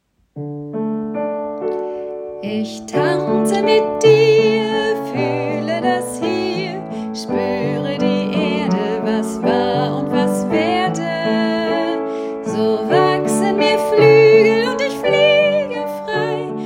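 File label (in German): Tanz für Lena Feenlied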